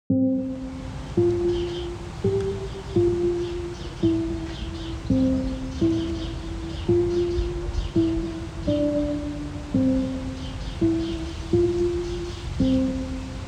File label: Recorded Ambience; The iPad was sitting in the garden during a lovely hot summer's day. Recorded Audio is uncut.; random recordings, taken from obscure places